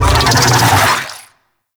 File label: Infested Big Ashen Vocal Attack; Warframe